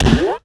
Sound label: Ball Fan Belts, Impact; Cars; XBOX